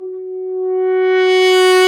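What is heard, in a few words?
brass, F.Horn Swell; Roland L-CD702; F.Horn FX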